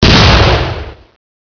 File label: grenade boom; gearbox; demoman